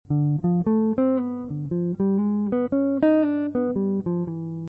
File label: g7 phrase